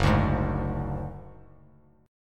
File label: F sharp Suspended 2nd Sharp 5th, Bass Standard; strummed; JGuitar